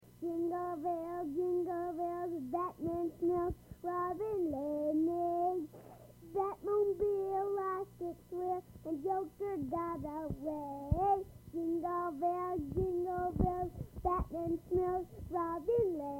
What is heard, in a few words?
reel to reel kid christmas sing